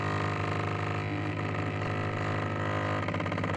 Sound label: computer fan noise